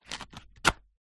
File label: foley cigarette packet close